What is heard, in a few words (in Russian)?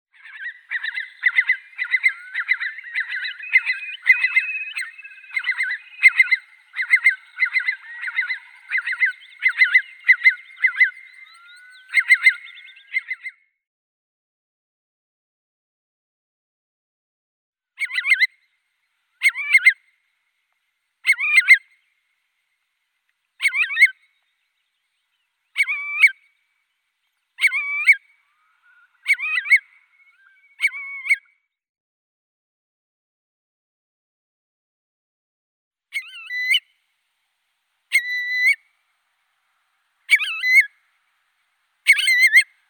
kronshnep bolshoi numenius arquata